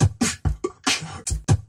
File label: four slowed, Beatbox, Drum loops